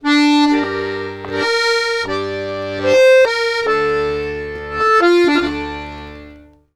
Accordions; USB Soundscan vol.40; AKAI

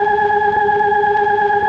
OPTIGAN G#3; Sonic Reality; Vintage Timetraveler

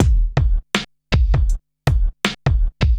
Street Tip, R&B Drums

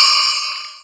BELL HIT C3; Big Fish Audio; Synth City

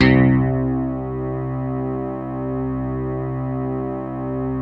ROCKIN BA; Greytsounds; Scott Peers Sound Engineering

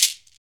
SHAKER, Afro Shakers, Shake&Rattle

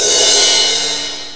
ride, cymbals kit; AKAI; USB Soundscan vol.26 - Big Beat Samples & More

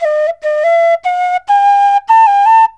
SERULING, Heart of Asia

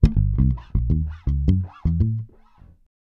F#, Bass, 81 BPM; Sampleheads; Ultra Freakin' Bass

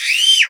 Scream

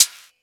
Misc Hat; Mo' Phatt; Digital Sound Factory Mo' Phatt

